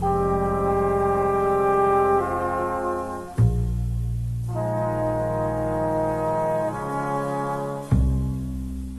horns, Loops

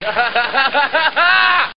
laugh

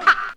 "ha" scratch get it; ooh ahh

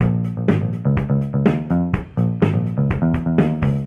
drummy; Loops; Electronic

